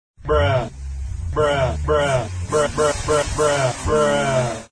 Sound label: bruh car